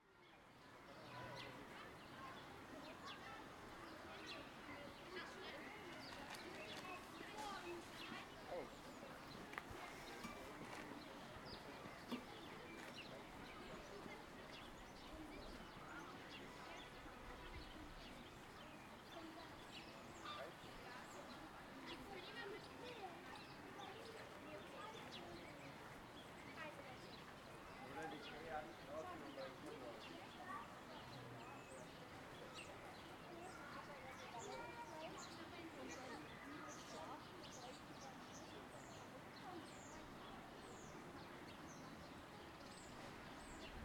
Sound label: Park, Berlin Zoo Kids Talking Walla Birdsong; AMBPark; Bolt; Berlin Vignettes - Ambiences and Sonic Curiosities